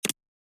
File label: keys, inventory